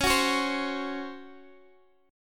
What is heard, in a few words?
C sharp Major 7th Suspended 4th, Guitar, DADGAD